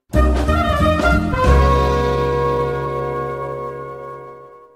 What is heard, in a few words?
music stinger player respawn; Family Guy respawn